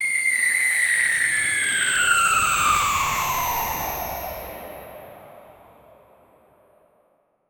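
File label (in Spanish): caída en primera persona d, falling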